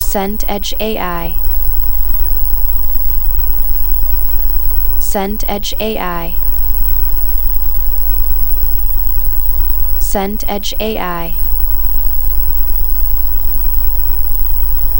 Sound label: ambience office